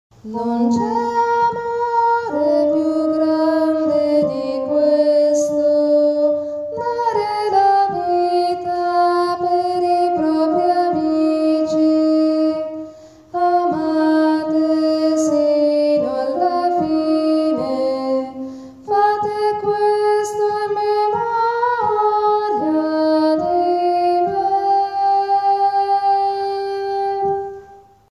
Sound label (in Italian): li amo fino alla fine contralti